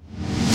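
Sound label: Roland R-8 backwards tom1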